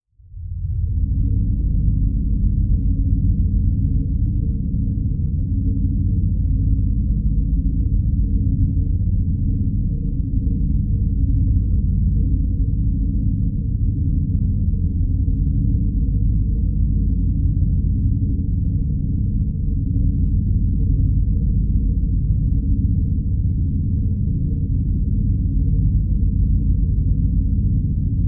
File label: Drone Electronic Hum Ballast Science Fiction; Production Element Imaging; AdobeCC